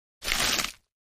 Foliage Impact Hard; hits & impacts

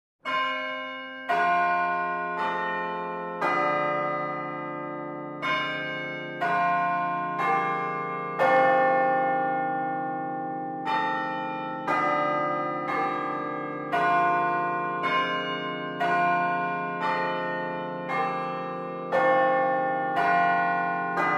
Bell Tower Close Pers; bell towers

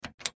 Push Button Plastic

